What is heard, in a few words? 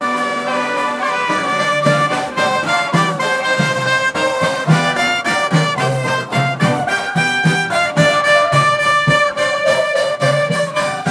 folk russian traditional, fanfare